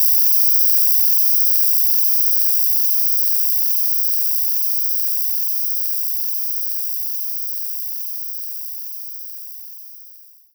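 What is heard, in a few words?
Vibraphone loop; knights try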